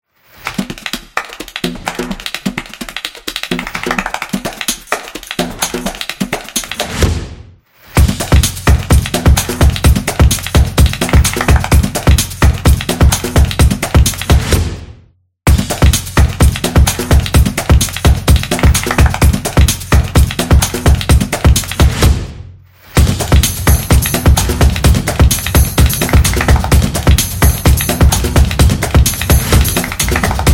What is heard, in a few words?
Fast Pace; fast tempo